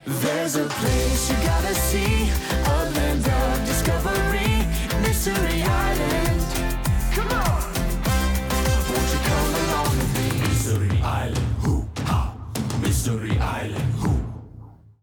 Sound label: theme music; mystery-island